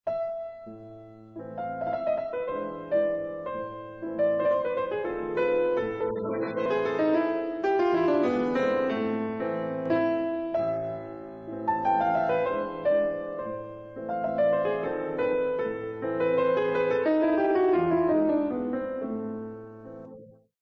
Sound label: ORCHESTRAL